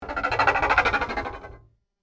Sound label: alien sounds